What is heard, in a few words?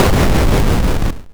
explosion crunch; kenney; sci-fi sounds